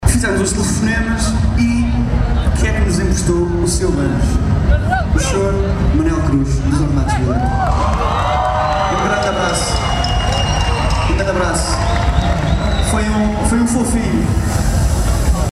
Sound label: banjo